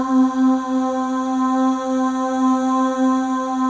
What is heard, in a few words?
FEM AHS; Spectrasonics; FEM.1 AHS